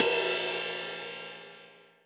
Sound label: Ride; Vengeance Samples; VEE Cymbals